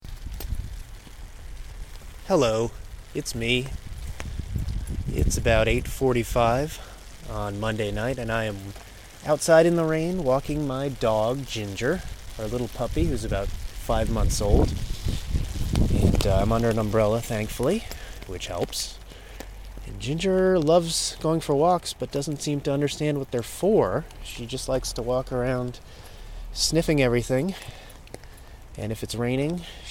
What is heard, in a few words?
rainy night dog walk